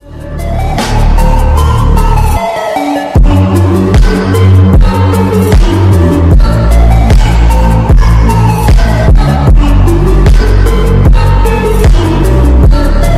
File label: bass boosted slowed